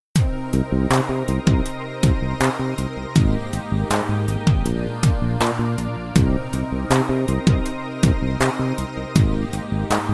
KWS VOICE AIR STRING

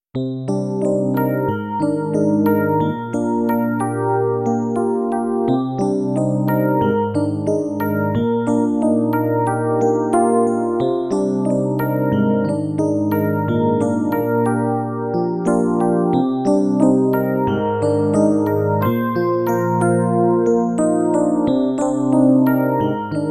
KB BELL DX

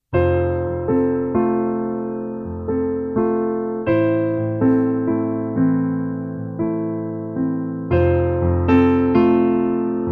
PIANO

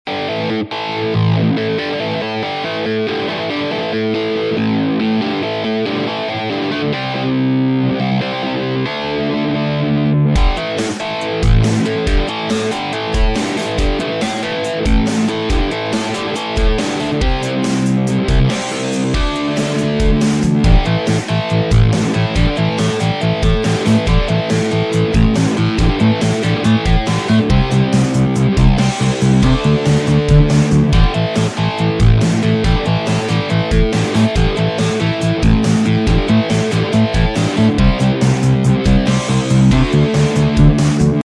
POWERDRUM ROCKNROLL; BEATZILLE

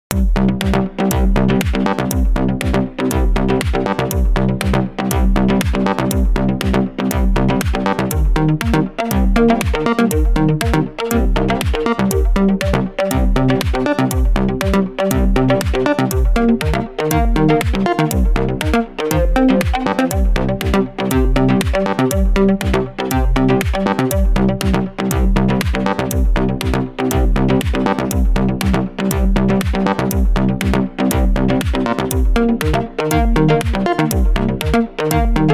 DRUM BASS

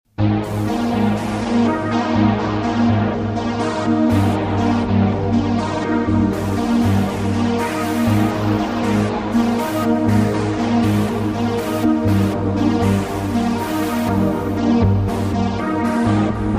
PAD; S90; SVAPOPAD

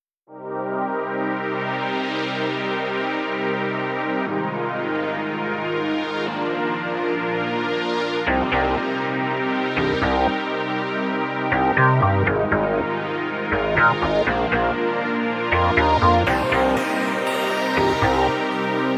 SYNTH1; MINISTERIALE

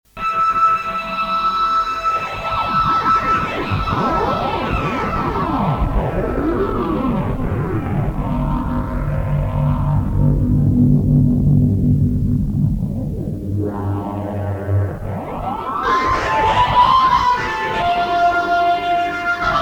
BIT REDUCER

